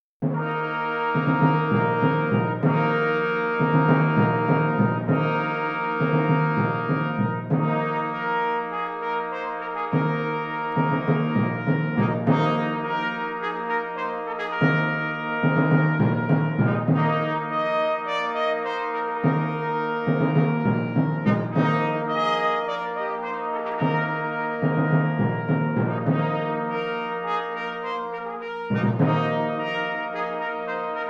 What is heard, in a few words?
brass royal fanfare